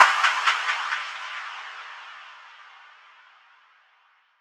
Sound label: Snare FX; Ghosthack